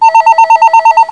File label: ring